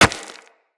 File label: Run Rock, Footsteps; Operator